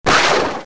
rocket; Sports Illustrated Kids Sports Encyclopedia; Creative Multimedia 1994

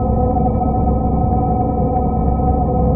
engine

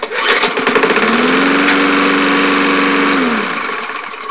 MOWER

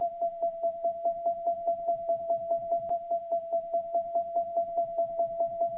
3D ROLL

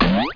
WRONG NOISE

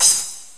Splash Cymbal; GMPlay; Drums; TG300B

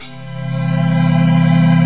ghost, maj7

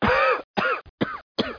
cough; rott

